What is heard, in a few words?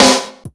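175 UK snare drum, Ragga & Dub Reggae; USB Soundscan vol.27; AKAI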